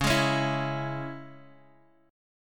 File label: Db Minor, Guitar Open G